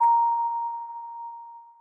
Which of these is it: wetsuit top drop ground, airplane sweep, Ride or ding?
ding